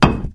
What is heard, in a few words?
concrete impact hard